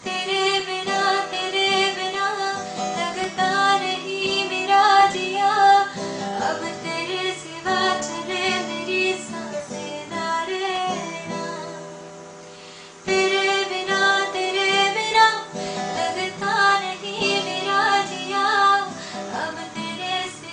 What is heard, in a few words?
Female